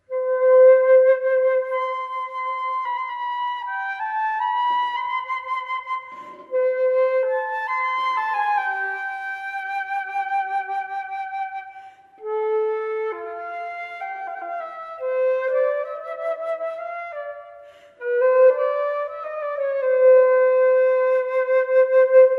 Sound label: flute